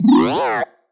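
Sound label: Sci-Fi Droid Robot Emote; jasem just another shooter with electronic music windows 2017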